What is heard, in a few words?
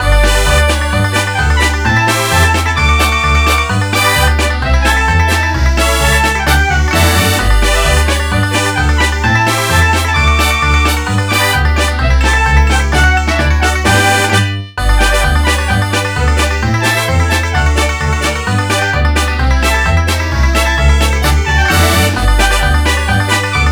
BGM Polka Night; Irodzuki Tingle no Koi no Balloon Trip; NDS